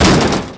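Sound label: hit metal; WhiteOut; XBOX; nevsky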